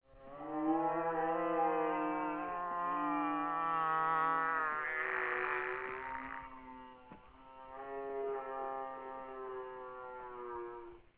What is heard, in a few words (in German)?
heul